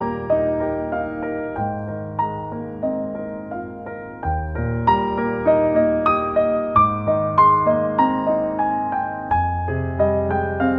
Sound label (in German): Sehnsucht Piano Solo